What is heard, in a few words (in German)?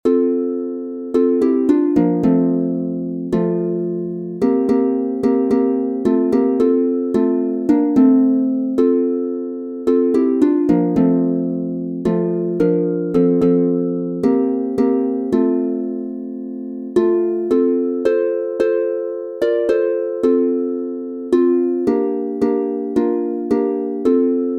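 F Dur 3 stimmig, Harfe; Go tell it on the moutains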